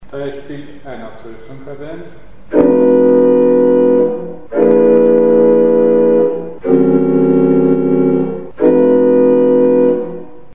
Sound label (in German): Eine Zwoelftonkadenz; Mikrobuch